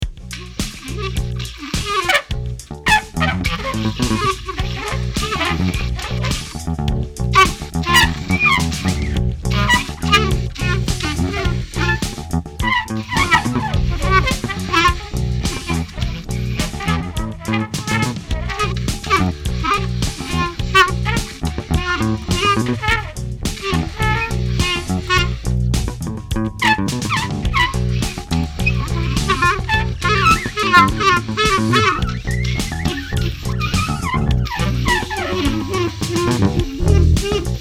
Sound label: jazz